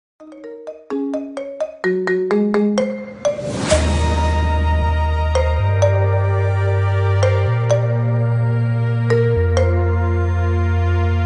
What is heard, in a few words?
marimba ringtone remix